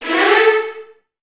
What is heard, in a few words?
VN FX, Virtuoso Strings